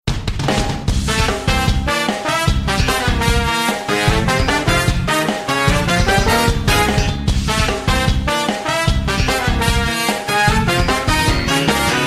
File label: Battle Stadium music